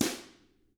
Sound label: DW BR, Drums; BRUSH